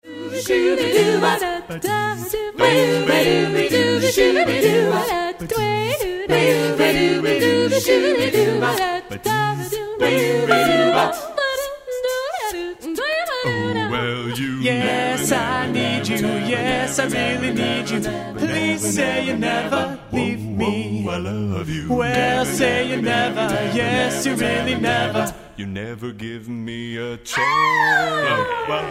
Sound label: Vocal Group; Chicago